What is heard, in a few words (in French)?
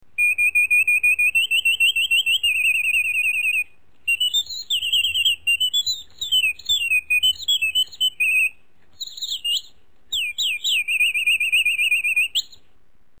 oiseau cage; reuge